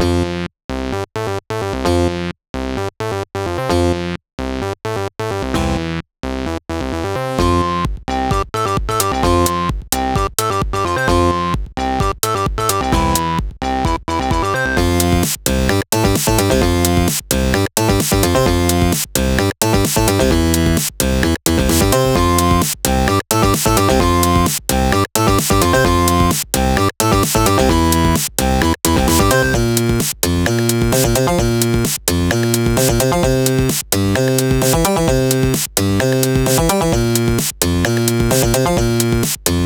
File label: Retro Platformer Theme (looped); Retro Platformer Music Package (Mixed Systems)